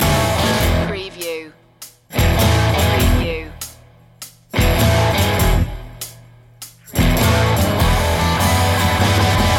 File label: delta sound loop